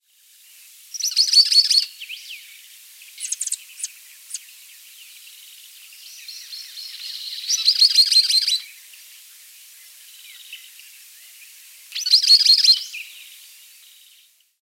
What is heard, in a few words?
Drymornis bridgesii calls, Campo Maria